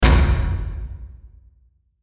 Hit Impact Slam Reverb; Production Element Imaging Element Accent Transition

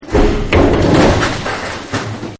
Trip And Fall; Wrestling And Struggling; Trip And Fall-Norm 01

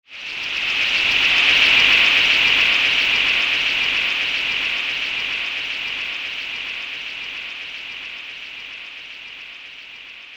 Flight Of The Vampire Bats; Bat Sound Effects; horror sound effect; Andrew Fleming

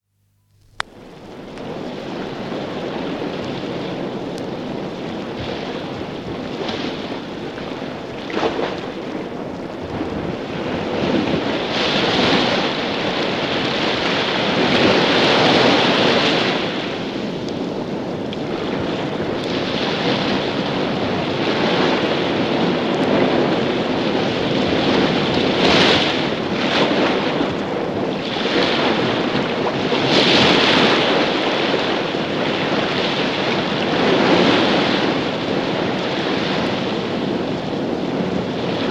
Rough Se, Rough sea on shore; from 78 disc; Ocean; Nature; BBC

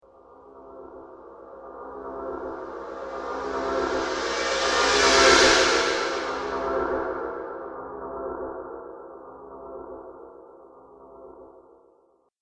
Dark Dreams Transition, Scary Soundscape

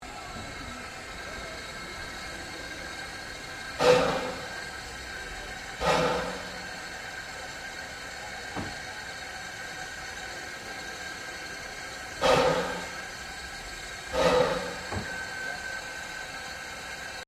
Train NA Class Chuff Sequence, Steam train; PUFFING BILLY 1908; Melbournes classic Puffing Billy Steam train; Trains

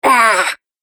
Cartoon Game Voice Wounded

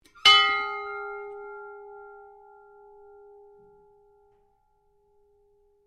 SHIPS BELL RING; Ships Bell Alster Star; Various ships bells; Boats; Vehicles

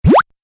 8 Bit Style Slip; video game

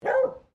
ANIMAL DOG BEAGLE FEMALE BARK

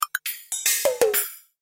Percussion Rhythm, Percussion Rhythm High; Cartoon Percussion Rhythm High; Cartoon Music

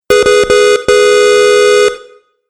Car Horn Four Honks; Car Sound Effects; vehicle

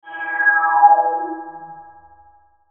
Mouseover Flange Descend Ring; Multimedia; Blastwave

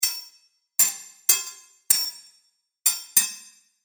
Short Sword Clash Reverb; Fantasy Sound Effect; Andrew Fleming

